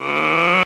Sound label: growl martial arts, Voice; ooh ahh